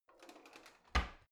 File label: old drawer creaky soft half in